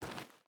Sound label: footstep boots dirt walk; Rust